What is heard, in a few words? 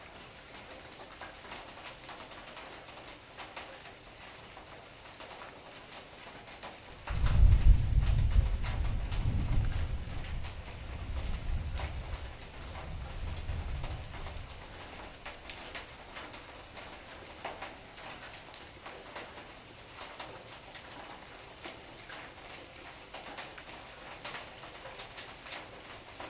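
Rain indoors with Thunder (slow 50)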